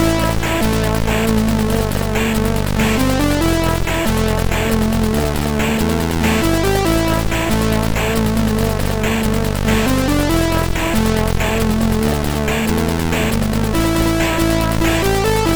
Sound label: sid MOS6581R3